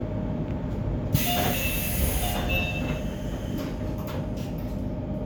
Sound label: door